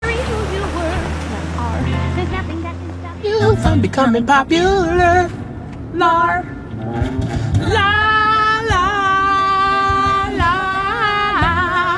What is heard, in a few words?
singing